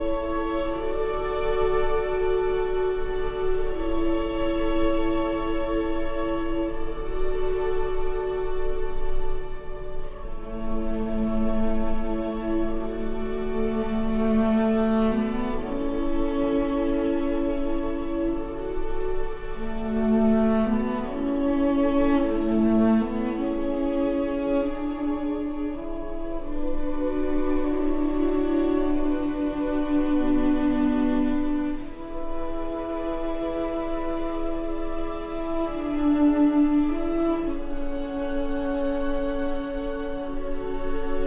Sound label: for cello and strings